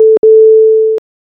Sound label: elevator arrive sound